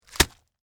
Impact Cabbage Head, Drop, On, Cabbage, Leaf, Pile; Texture; Vegetable; Drop Sound Effects